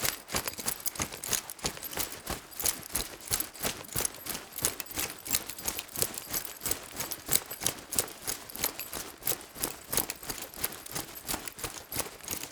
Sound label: LEATHER BACKPACK JOG; Joshua Reinhardt; Ultimate Cloth and Prop Collection